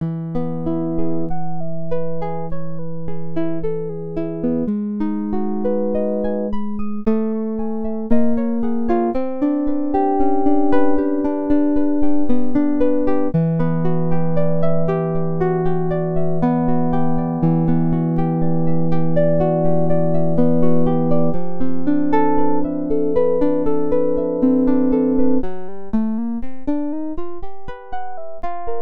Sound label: guitar